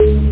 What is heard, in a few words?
bong thin; Loop Scream Tracker Sample